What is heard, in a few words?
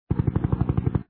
plane